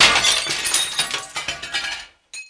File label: Glass Panel Big Brea; windows & mirrors